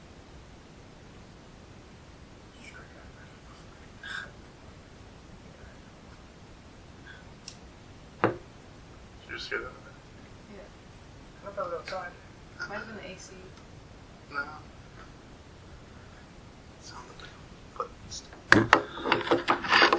Catwalk EVP; twin city opera house investigation

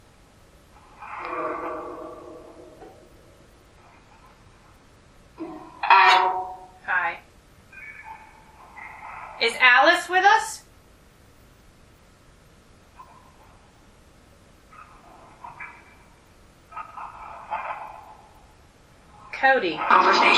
Living Room Phasma Box; private residence freedom road apartment investigation return

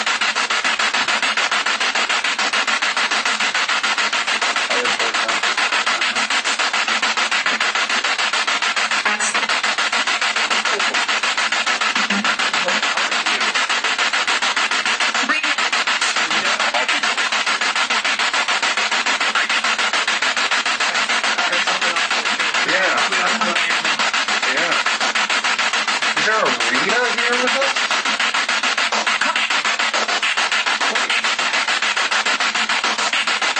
Kitchen Spirit Box

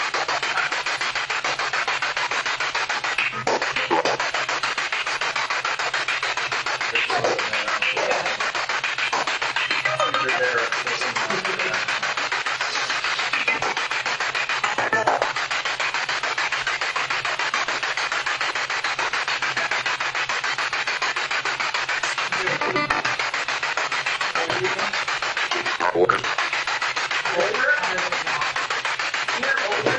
Spirit Box; Civil War Wing 3rd Floor Armour Room; madison seminary investigation

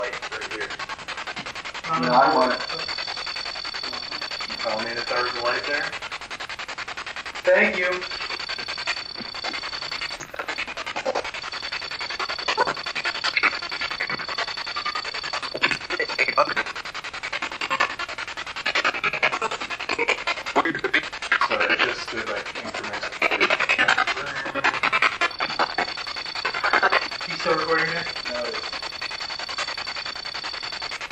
Spirit Box; 2nd Floor Hallway

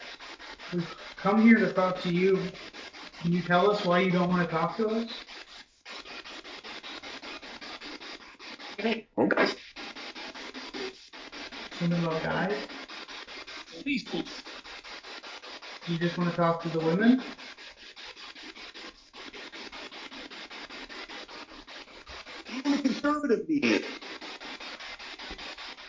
Walking to Vestibule Spirit Box

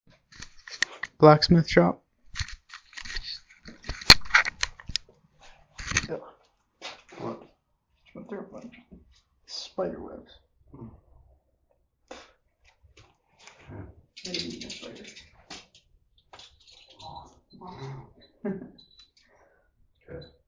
Blacksmith Shop; historic rainworth lodge investigation

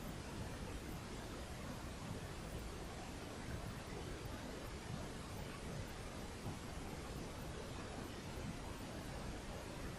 Barn EVP

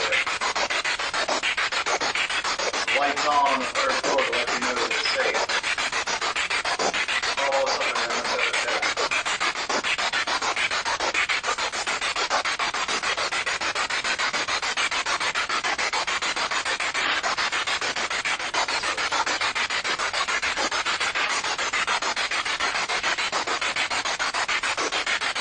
Basement Spirit Box

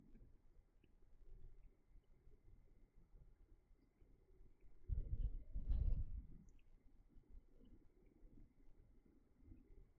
Room EVP

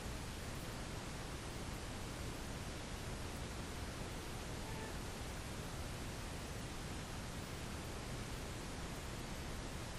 Tattoo Shop Static

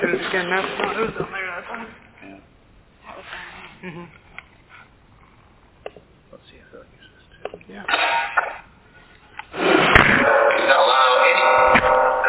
Phasma; Second Floor; martinsburg roundhouse investigation

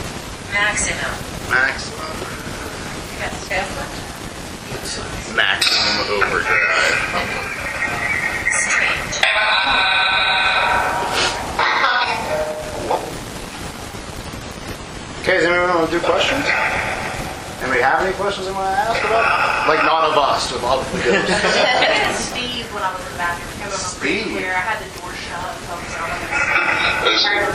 Room 409 Downstairs Phasma Box

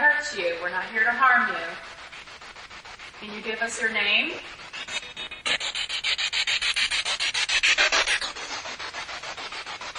Attic Rec Area Asylum Spirit Box; madison seminary investigation

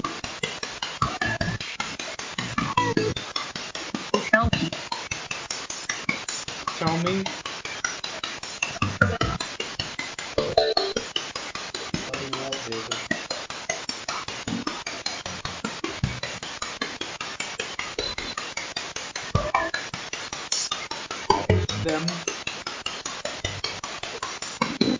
Train Station

